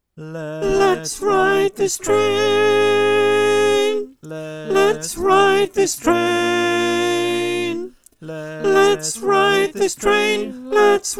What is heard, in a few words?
Soprano